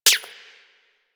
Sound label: Rifle Mech; Wisp Rifle; Warframe